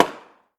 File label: sound Balloon Pop